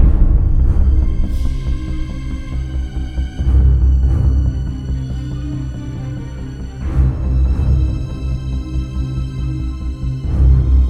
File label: intensity; arcane